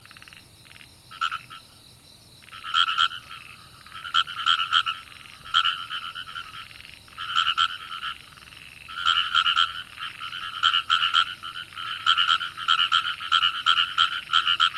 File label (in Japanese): himeama numa